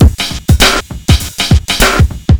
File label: shufflin beat; Sonic Foundry (Sony Creative Software); Ugly Remnants VOL-2